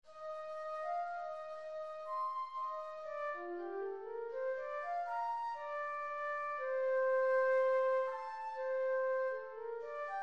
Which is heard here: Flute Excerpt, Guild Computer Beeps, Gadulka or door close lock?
Flute Excerpt